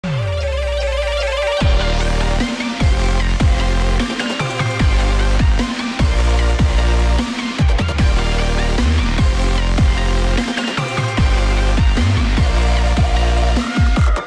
High Cut, High frequencies filtered on the DJ Controller; Modelling Filters on a DJ Controller